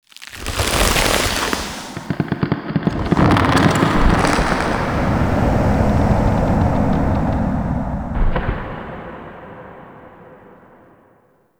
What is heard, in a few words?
Foley Get Up; Cinematics; Ballas; Warframe; TheNewWar; Throne Room